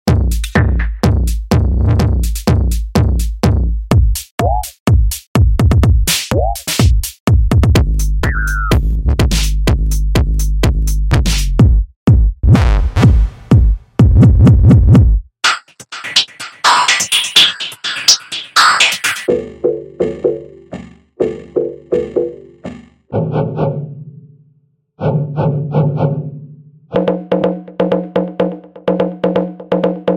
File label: techno glitch